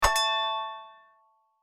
Lifebuoy Collection; My Cute Commissar

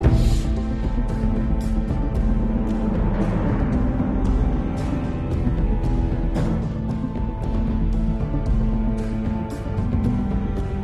loop Action

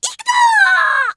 Launch

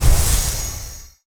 warrior quick attack

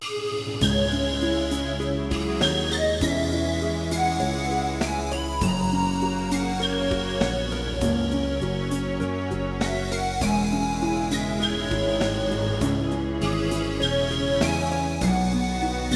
Story Dialogue (Sad Story); Shinkon Gattai Godannar PlayStation 2 gamerip

